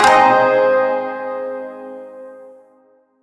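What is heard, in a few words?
Shawzin Minor Chord I; Chords; Warframe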